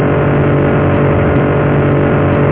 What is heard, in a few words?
ENGINE; WRECKAGE